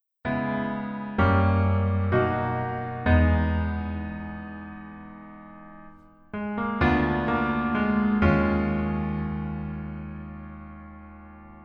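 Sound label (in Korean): Piano version 멜로디MR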